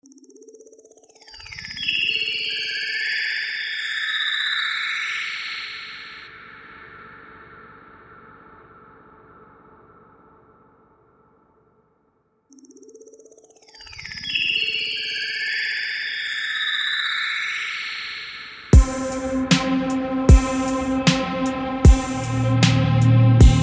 808 type beat

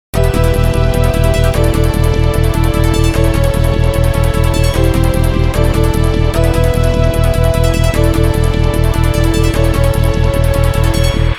God of Thunder and Bass

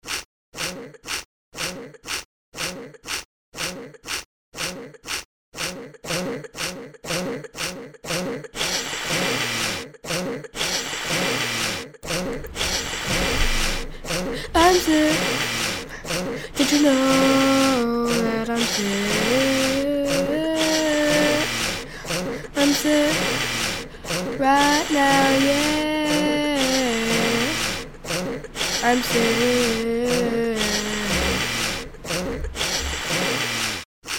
20 seconds of awesomeness Acapella

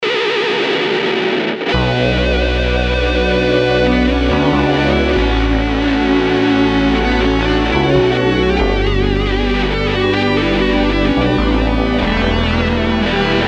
Guitar Solo

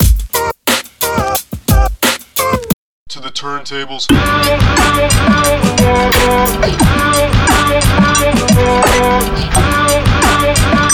guitar and turntable

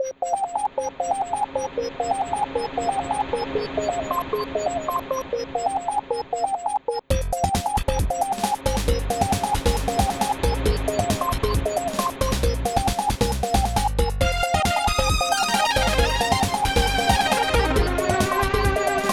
Shiptune chiptune

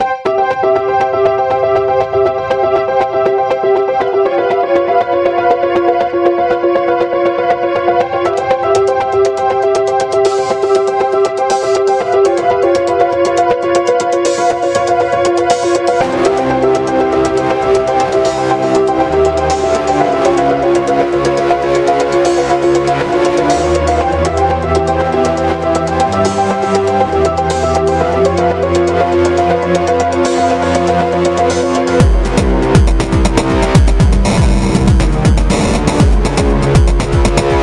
Acoustic

